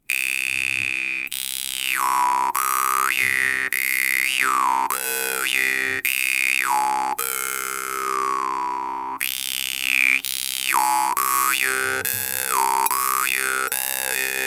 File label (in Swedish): mungiga munharpa jaw harp; vargan glazyrin phantom c2; MKR-46C2